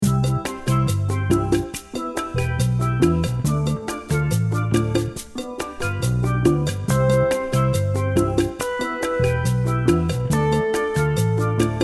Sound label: descending scale backing track; Calypso; Caribbean music